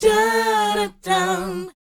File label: BOYZ D#, Vocal Planet R&B & Dance; ILIO